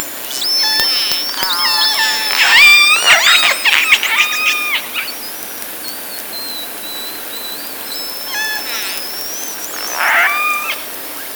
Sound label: Orca sound Night Passby